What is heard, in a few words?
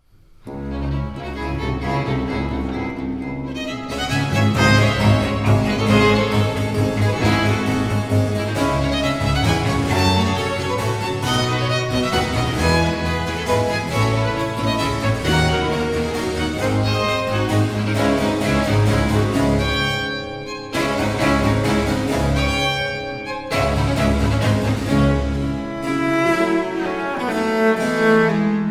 concerto for cello in d minor allegro